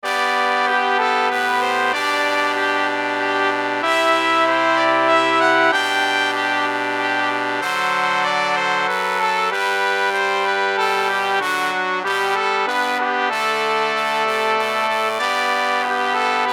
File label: solo trombone; Solo Tenor Trombone